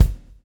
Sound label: swing